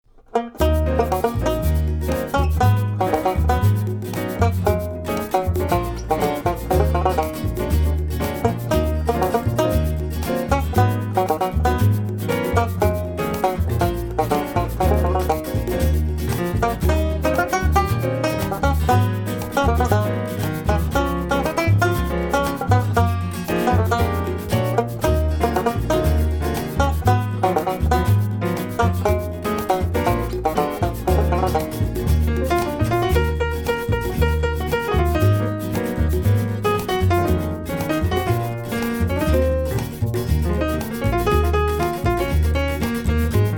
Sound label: Banjo